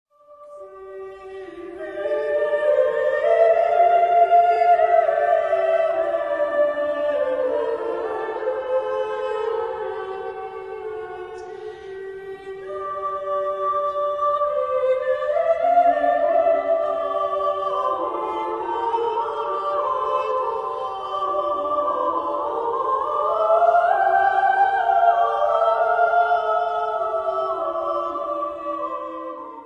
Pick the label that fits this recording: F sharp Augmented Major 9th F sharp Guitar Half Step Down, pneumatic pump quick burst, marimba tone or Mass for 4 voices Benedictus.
Mass for 4 voices Benedictus